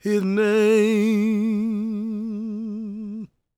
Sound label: GOSP MALE, Vocal Planet Gospel